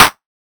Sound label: claps, dance drum kit